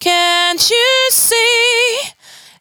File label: 92bpm Bb, Velvet Vocal; Zero-G